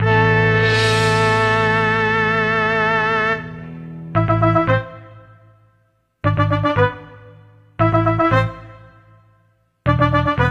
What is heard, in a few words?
BGM; Adventure Player; psp